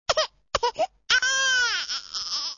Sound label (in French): vocale bébé